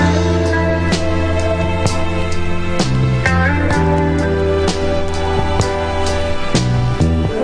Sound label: super smooth, sampled music loops; MELODIC LOOPS